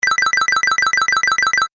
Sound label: Game App Jackpot, Video Game Sound Effects; Twistedtracks